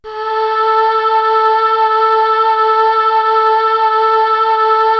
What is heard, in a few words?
cho a3, Choir, KORG DSS-1; Retro Sampler